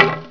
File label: bump; openffa